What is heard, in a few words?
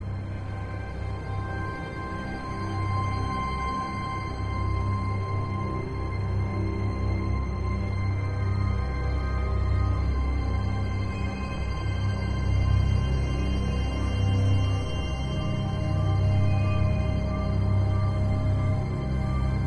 Loop Tense; the walking dead season one